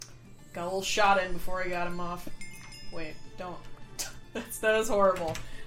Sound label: shot